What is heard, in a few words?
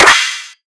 Clap, Dubstep